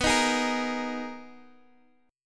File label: Eb Suspended 4th B; Ebsus4/B strummed; JGuitar